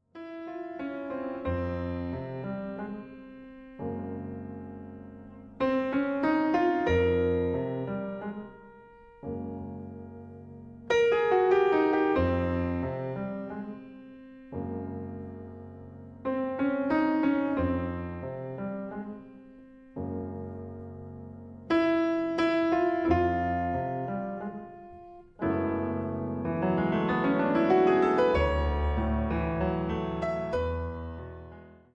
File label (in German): Schlaflied